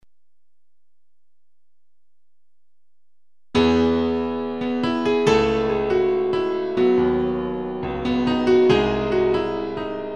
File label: Piano